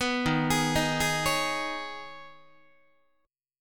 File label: D sharp 7th Suspended 4th Sharp 5th, Guitar; D#7sus4#5 arpeggiated; JGuitar